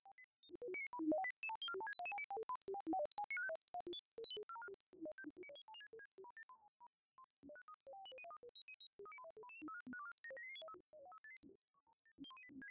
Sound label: alien beeps; cstrike; byob